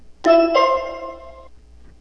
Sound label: clock